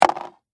Game Dice Roll, Sports, Board, Game, Dice, Roll, On, Game, Board, Single; BlastWave